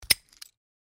Large metal padlock open click; locks keys

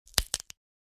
Arm or leg bone breaking; Gore

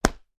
Catch leather baseball glove

